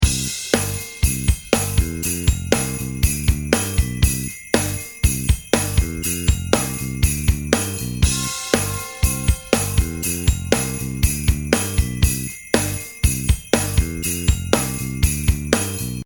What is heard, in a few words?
Bright Christmas Band Loop; Musical